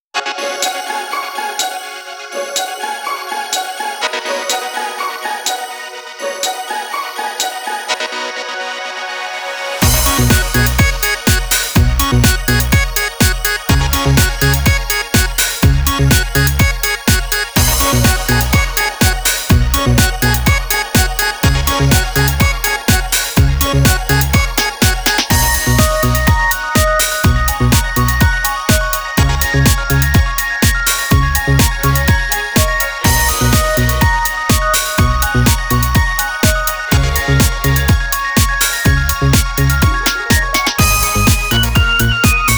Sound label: Game Start; Super Forest Beat vol12 2021